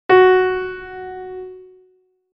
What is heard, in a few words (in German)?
Intervalle simultan